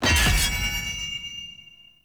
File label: Short Sword Medium Parry Perfect Counter, Weapon Impacts; Player; Swords; Combat